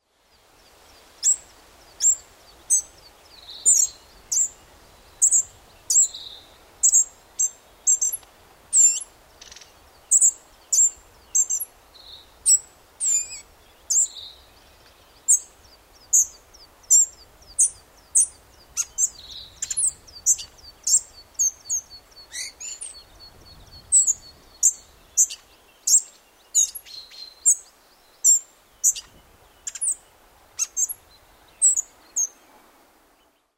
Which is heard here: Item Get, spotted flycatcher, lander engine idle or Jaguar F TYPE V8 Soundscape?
spotted flycatcher